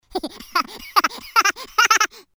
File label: small cartoon character laughing; мультяшный смешной смех различных персонажей